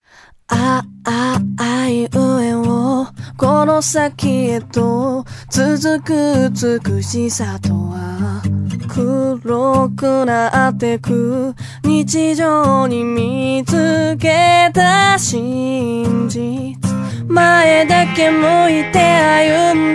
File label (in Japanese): マネキン TOY